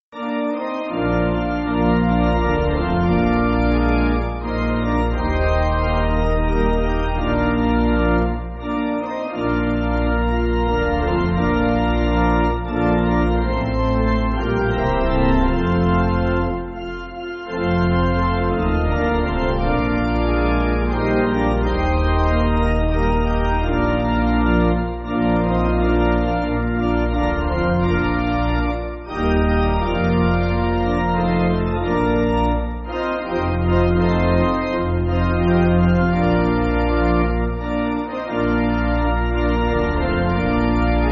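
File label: Pipe Organ, Music Backing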